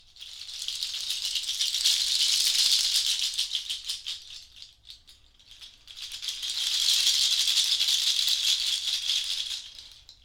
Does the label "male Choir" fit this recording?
No